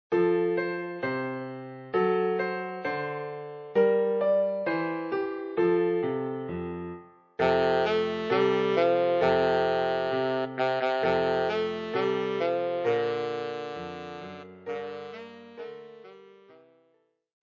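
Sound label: tenor saxophone; Jingle Bells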